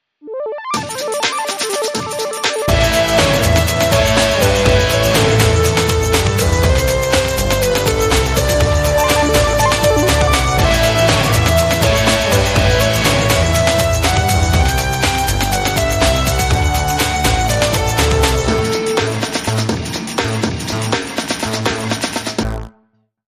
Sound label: Victory